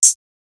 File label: clear dope swag hi hat, hip hop